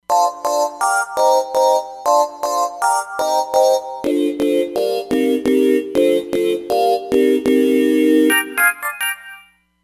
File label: Sweet House, Roland Fantom XR